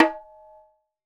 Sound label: Dholak Na; Roland BK-5; Percussion